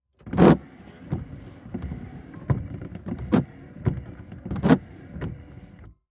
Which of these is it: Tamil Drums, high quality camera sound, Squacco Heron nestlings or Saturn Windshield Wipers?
Saturn Windshield Wipers